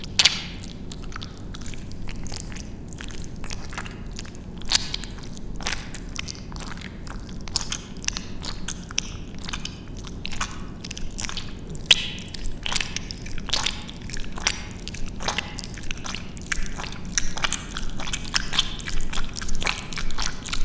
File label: Slime Noises